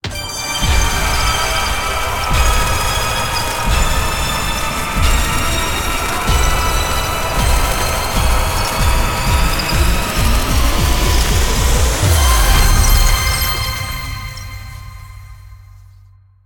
mega Win; netent; dracula

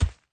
symbol Stop On Ground; netent; eldorado

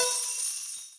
big Win End; netent; eldorado

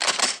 spin Button Click; netent; deadoralive

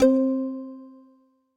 spin Button Click; netent; happyriches

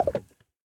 quick Stop; netent; sweetyhoneyfruity

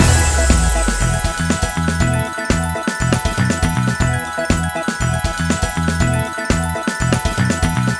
big win loop; netent; kingofslots

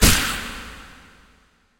quick Stop; netent; bloodsuckers2

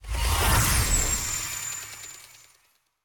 free Spin Animation; netent; dracula

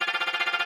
win countup loop; netent; copycats

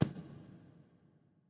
reel stop; netent; bloodsuckers2

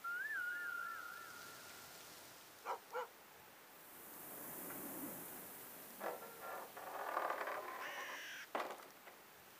ambience General; netent; deadoralive

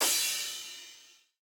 big Win Start; netent; whenpigsfly

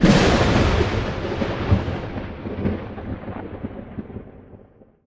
thunder; netent; deadoralive

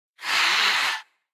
scream small; netent; dracula

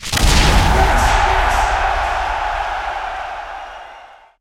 mega Win Start; netent

